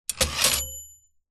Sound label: typewriter carriage return margin bell vintage 1960 lemair helvetia